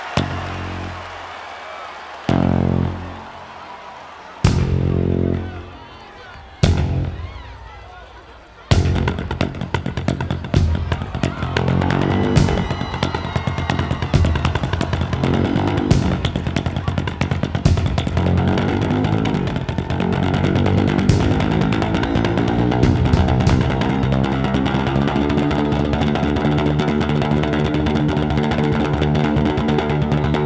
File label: Drum Solo